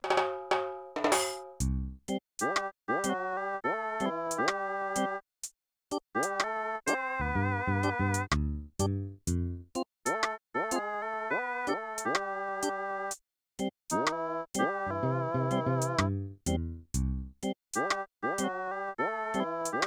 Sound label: Reggae; Animal Crossing Music Extension Revived; kk; aircheck